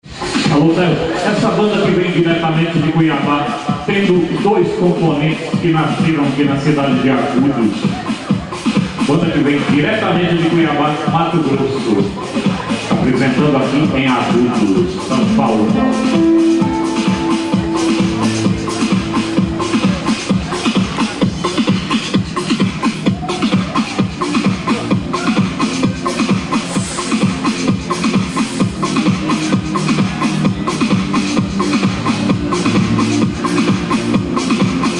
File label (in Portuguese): abertura ao vivo; em agudos sp